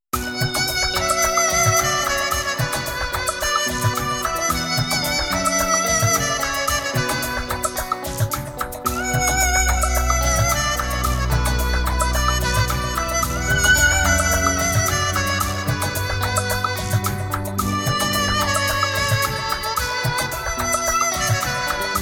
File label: (Sad); Dil Maange More